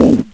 Push; Mr. Cat's Quest 3.1

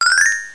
transition

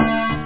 italo, rave; da capo vol1; instruments

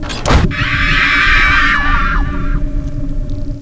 SCREAM; mtm2demo; PC Gamer 4.2

